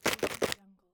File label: metal softgel container shake